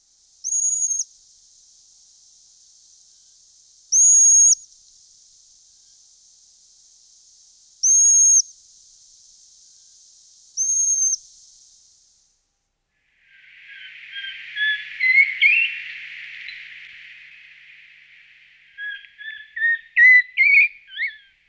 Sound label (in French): chouette chevechette cri